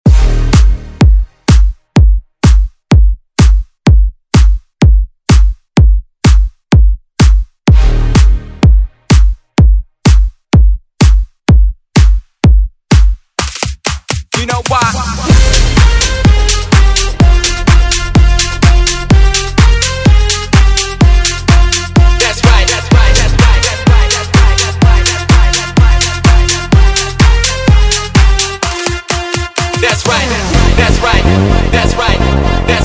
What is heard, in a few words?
Dance Redrum